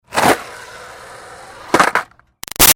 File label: Skateboard Jump Wheel Spin Land; Skateboarding; Sports; Big Room Sound